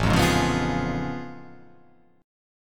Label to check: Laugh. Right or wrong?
Wrong